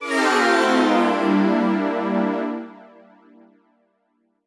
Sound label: Jingle Lose; Super Monkey Ball Banana Splitz; PS Vita